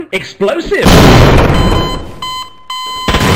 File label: explosive